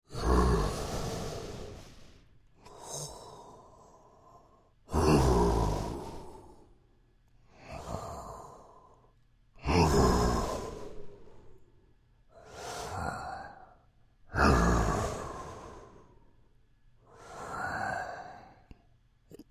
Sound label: Creature Breaths Deep Resting, Human; Breathing; Creature,Human,Breaths,Deep,Resting